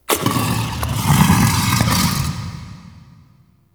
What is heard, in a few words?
Interior Gurgle Slurps, Infested, Ambience